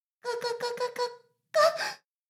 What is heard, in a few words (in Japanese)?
シコシコ音声, ASMR; ダメなメイド